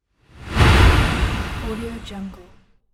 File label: air long whoosh